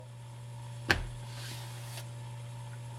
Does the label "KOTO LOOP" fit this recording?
No